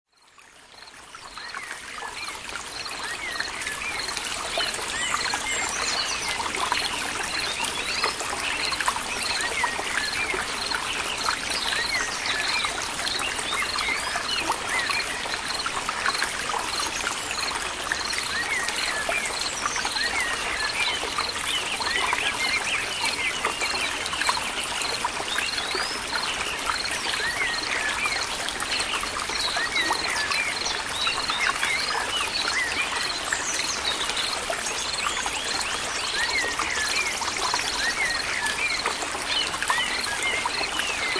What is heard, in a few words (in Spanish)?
Aves Del Bosque; BrainwaveLab; Magnum Naturalis